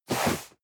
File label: cardboard box medium slide hard; objekte